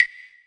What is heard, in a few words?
CLAVES, Roland TD 7; drum machines